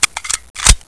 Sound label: M92 clic2, weapons